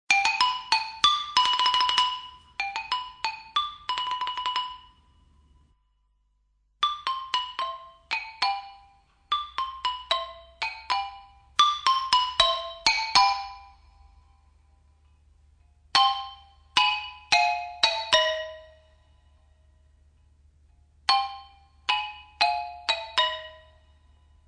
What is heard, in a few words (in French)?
xylophone